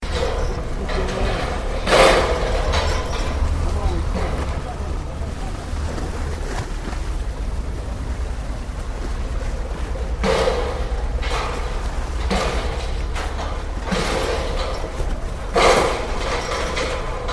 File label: clanging water platform in venice